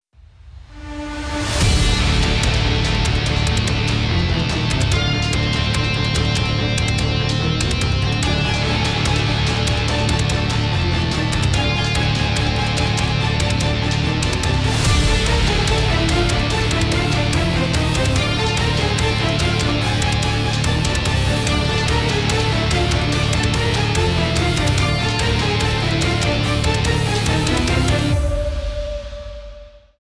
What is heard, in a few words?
Preparing Music; Ambiences; cstrike